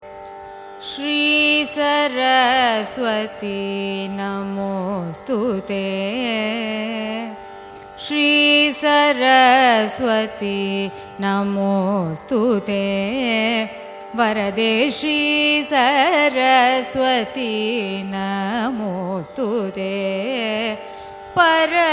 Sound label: raga arabhi carnatic